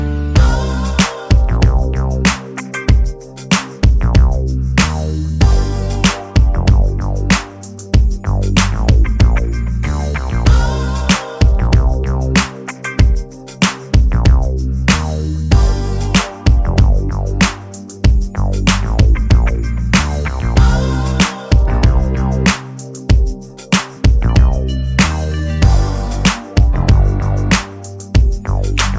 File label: Ringtone